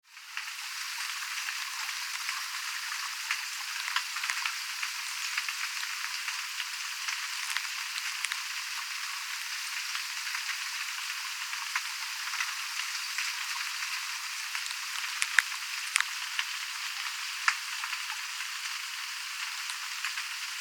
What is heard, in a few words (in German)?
aaresteine, aare; reise marzili